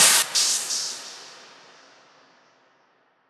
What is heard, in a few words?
FX Impact